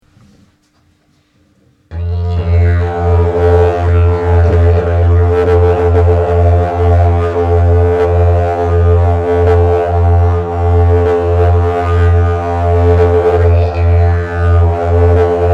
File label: Binaural Didjeridoo FA